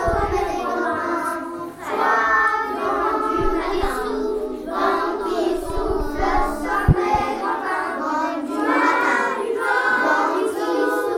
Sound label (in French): vent frais vent du matin